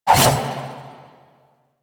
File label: Two Handed Nikana Spin Swing; Warframe; Duviri; Duviri Dax; Weapons